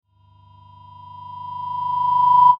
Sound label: CRESCENDO FEED, Crescendo Feedback Shimmer; Production Element Imaging Element Accent Transition